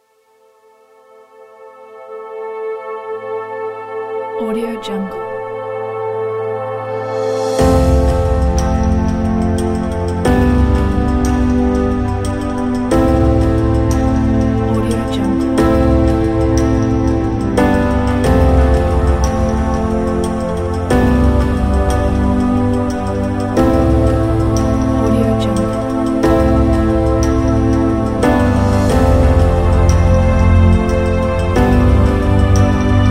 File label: epic ambient; nikolaizizenko